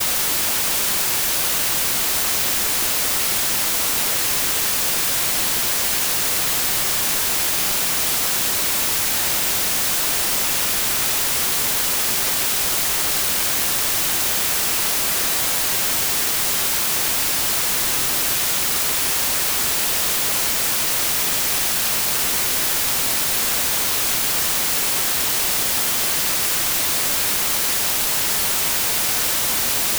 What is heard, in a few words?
white noise; speaker test